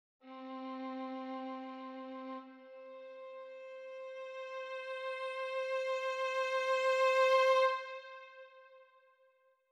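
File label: Vl solo vib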